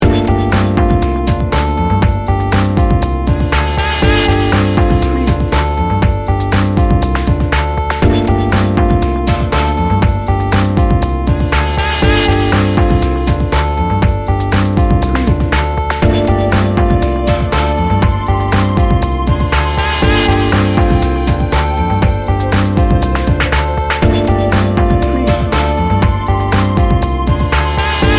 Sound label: cool energy